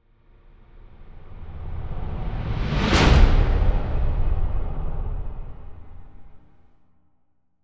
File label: Swell To Impact Flanged; bottlerocket